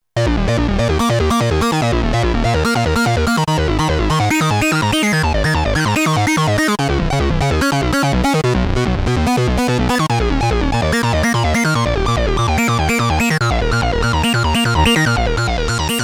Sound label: xmod, novation bass station rack; OSC sync